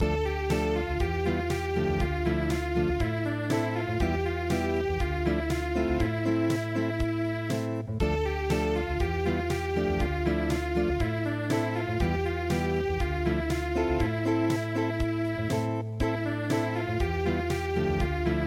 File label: dance Unplugged